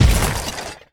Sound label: step